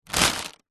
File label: foley plastic bag thick pick up; zapsplat